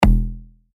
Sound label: log drums